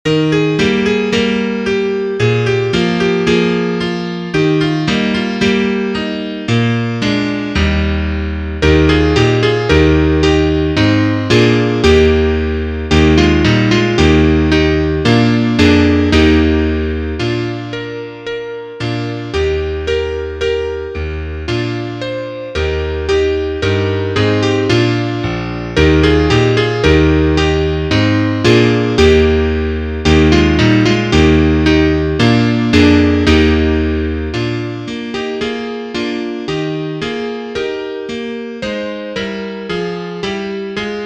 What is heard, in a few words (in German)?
Klavier; froehliche weihnacht ueberall